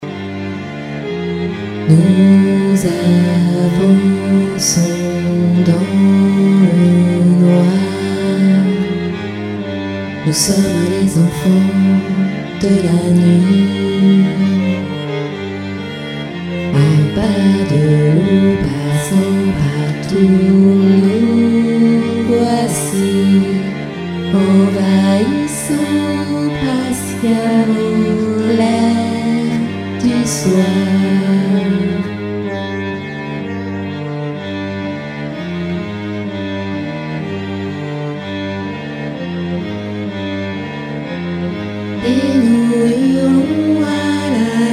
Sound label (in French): bas